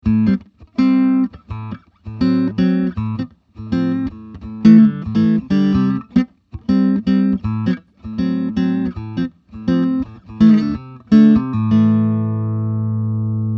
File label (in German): vox mv50 clean tele mitte; vox mv50 clean test